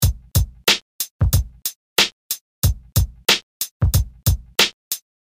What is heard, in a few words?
Sequenced Drums; FL Studio; basic, day one drums